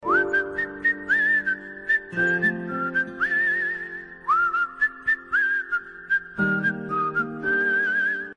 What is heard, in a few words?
Ringtone Instrumental; ringtones; Fanaa Movie